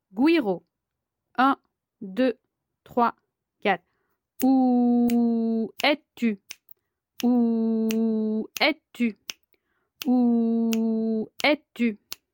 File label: guiro; Les rythmes de la batucada; les guiros